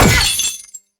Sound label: Bullet hit glass; Hovl Studio; SFX Battle sounds; WhiteMan Unity2D